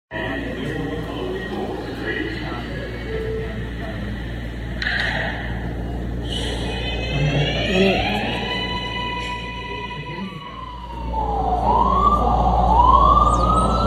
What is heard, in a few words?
Neighborhood Soundscape; summer institute 2026